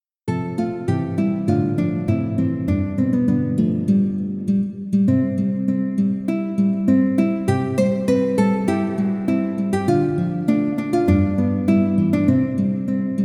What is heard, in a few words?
AcousticVer; Kirby